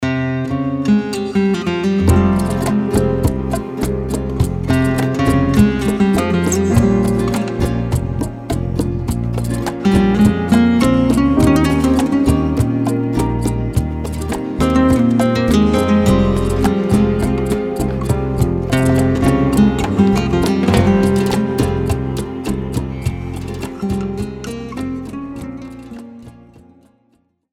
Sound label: sad angel